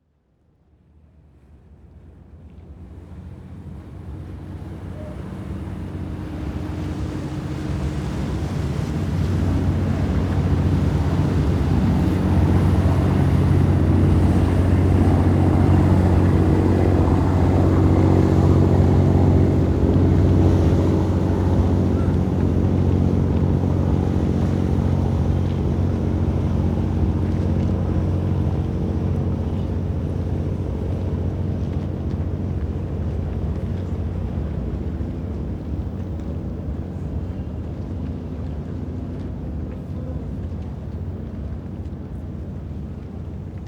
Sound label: hangar ships passby, ambient; War Thunder